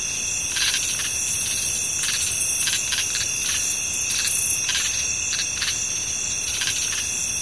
frogs loop, forest; ambient; levels

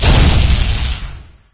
weld, energy; Garry's Mod; hl2

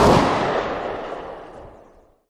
hkp2000 distant, weapons; Counter-Strike Global Offensive

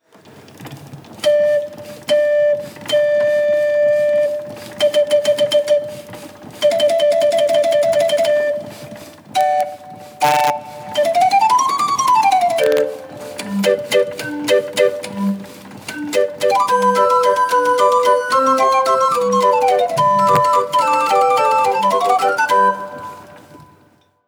Demonstration Organ, Mechanical Instruments; Perlee; Speelklok Museum

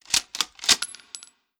infantry rifle cartridge; Hearts of Iron IV Death or Dishonor

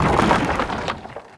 Trebuchet Stop; Sid Meier's Civilization 4 Complete; Warlords; Units

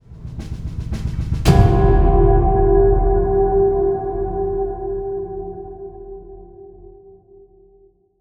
start game; Hearts of Iron IV Death or Dishonor

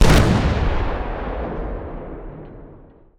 ships destroyer attack

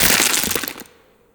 debris; Ace of Spades

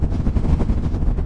teleport rings loop; Garry's Mod; hl2; labs

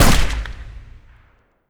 infantry fire standing; Hearts of Iron IV Death or Dishonor

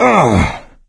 hurt minor, survivor voice; Left 4 Dead 2; coach

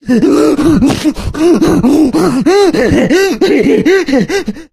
jockey lurk; Left 4 Dead 2

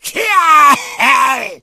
smoker alert, voice; Left 4 Dead 2

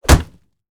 wooden hit high armor low damage; Mount&Blade Warband

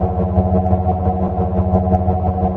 dog idle mode loop; Garry's Mod; hl2

